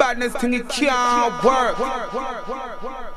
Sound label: breakcore; badness